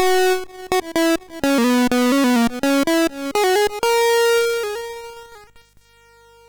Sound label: Arcade, sid MOS6581R2; Commando